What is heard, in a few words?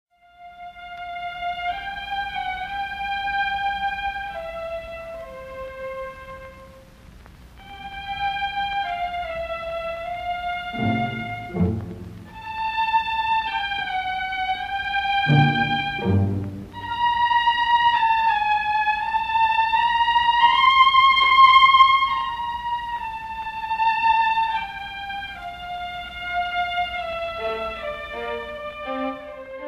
for orchestra Scene au champ Adagio; Symphonie fantastique